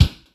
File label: Record Stopper (Kick); Sonic Foundry (Sony Creative Software) - Stylus Pressure Urban Grooves on Digital Wax